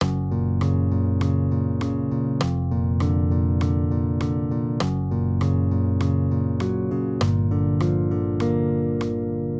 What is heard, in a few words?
Accompaniment, Major Triads, Root Movement Four Semitones, Gb-Bb 100